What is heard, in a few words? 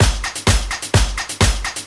Straight Loop 128 BPM, House